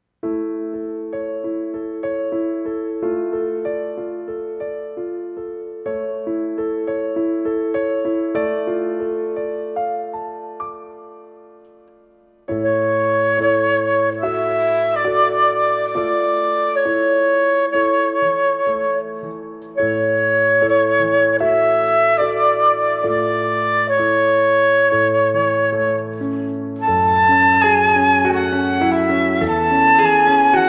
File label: Love Theme Flute